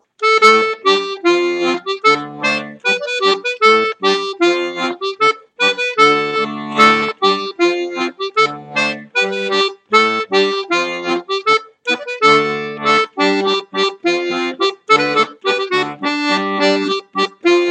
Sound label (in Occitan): Las perdes Min mélodie; Rondeau 2021